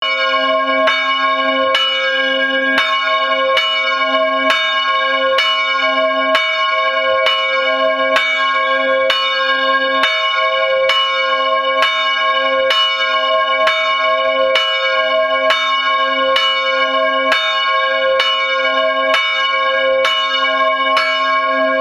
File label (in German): Glocke III